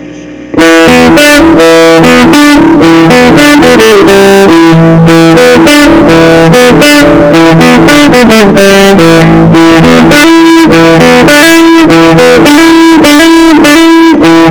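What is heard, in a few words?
Pi Treble, Big Muff Fuzz; guitar riffs; Stoner Rock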